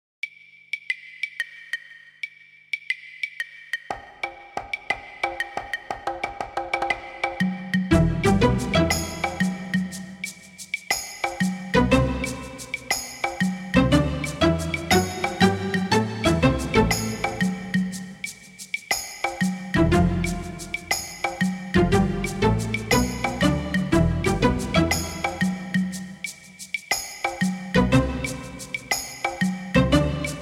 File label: raeggae